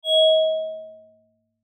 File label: Beep Tone Signal Multimedia; Web Interactive; Beeps; Technology; Blastwave